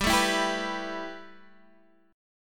A 7th Suspended 2nd, G

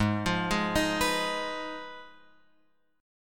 Ab Minor 6th Ab, down, Guitar, Open F; arpeggiated; JGuitar

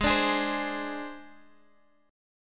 G sharp Major Flat 5th, Guitar, Standard; strummed; x,11,12,13,13,x

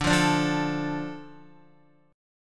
D Minor D Guitar; Dm strummed; x,5,5,7,5,5; JGuitar

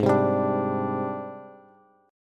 Ab Major Sharp 11th Ab, Bass Bass Standard; AbM#11; 16,15,17,19; strummed; chordshape; JGuitar

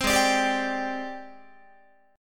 G sharp Major 7th Flat 5th Guitar; G#M7b5 Chord

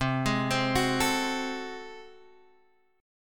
G sharp 7th Flat 9th C, chord

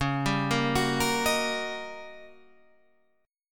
D sharp 7th Sharp 9th

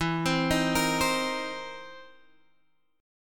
C Minor 7th E; Cm7/E arpeggiated

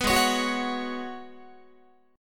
G sharp Minor 11th G sharp Guitar, chord; G#m11 strummed

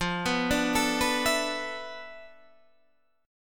D Minor 6th Add 9th F, guitar chord

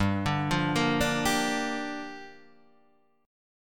G Minor 7th, Guitar, Half Step Down

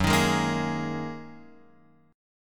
Gb 6th Add 9th Gb down Guitar Open F; strummed; Gb6add9; JGuitar

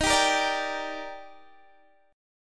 Eb Major 7th, Guitar Drop D; down

